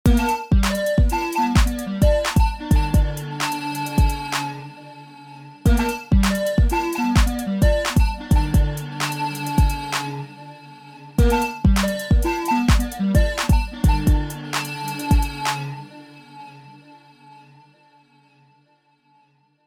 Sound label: evening clown beat